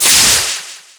Sword; Touhou Jitsuzaisou Dream Logical World

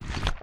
Blocker Open

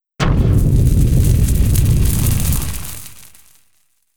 Flame Heat; Eatercomb

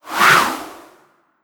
Hawk Speed Fly; Legend of Keepers

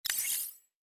Sound Recovery Item Use

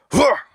Grunt; gamerip; Brumm

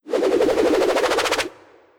Abyssalflame Whirl; Legend of Keepers

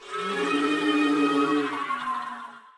deity single scream

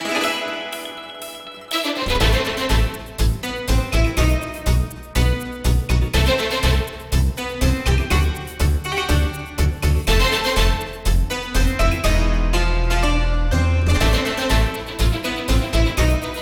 B Menu Event

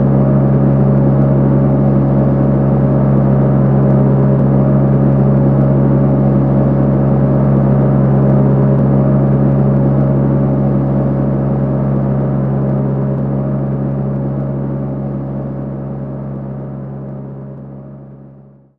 Sfx Athletics

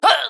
Pecheneg Archer Damage

